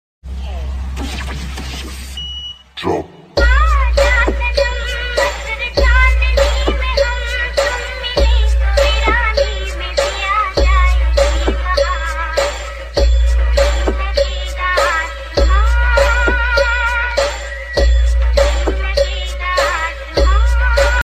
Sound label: hindi song ringtone 90s hindi romantic ringtone